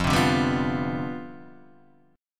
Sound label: C Major 7th Suspended 2nd Suspended 4th F, chord